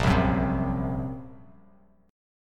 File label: Bb Suspended 2nd Sharp 5th, Bass Standard; Bbsus2#5; strummed; 6,3,4,5; JGuitar